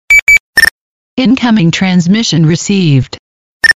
Nextel Incoming

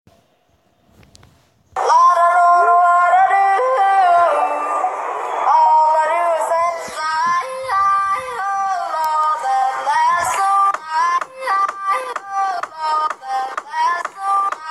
Yodeling kid

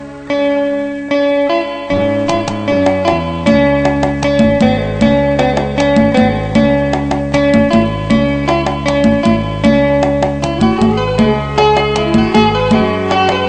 lao guitar

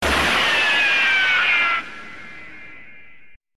Gun Shot

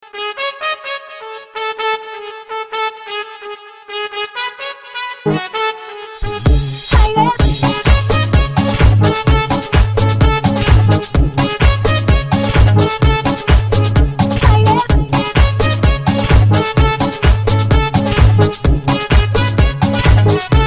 Trompet

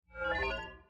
Sci-Fi notification

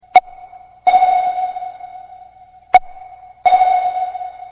Sonar

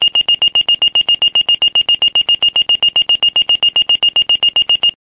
Minitor Pager

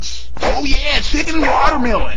Oh Yea Chicken

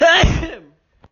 Euy Sneeze

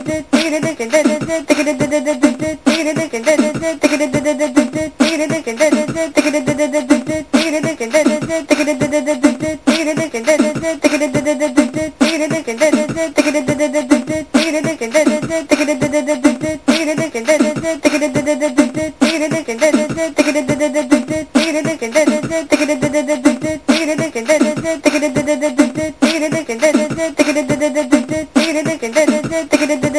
Manea beatbox

